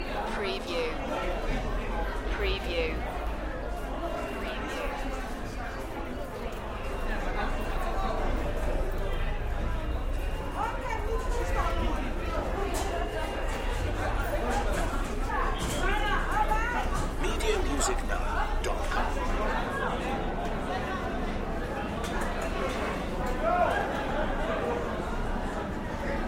Dense Crowd, Central Park NYC, Heavy Chatter, Walla